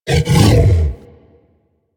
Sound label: Juggernaut Truffle Found Initial, Infested; Warframe; Truffle Hunt